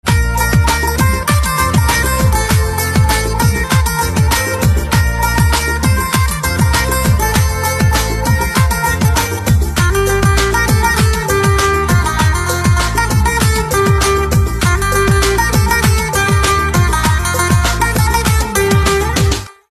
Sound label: Guitar Instrumental Ringtone